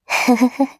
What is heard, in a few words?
laugh, JPN; Voice lIne; Eternal Return; Katja